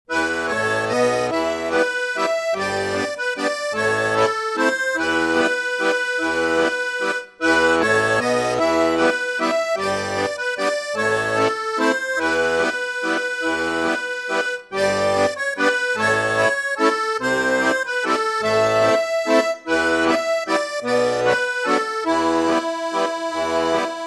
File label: ecuador waltz